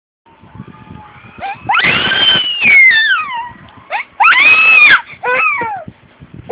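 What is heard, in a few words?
baby hungry, male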